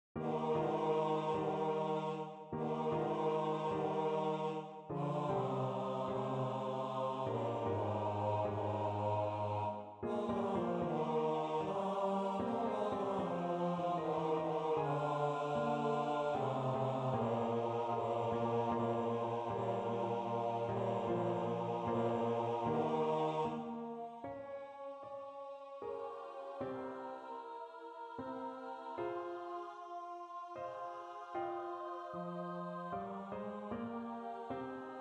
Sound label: bass